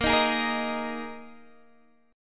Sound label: Bb 7th Suspended 4th Bb down Guitar DADGAD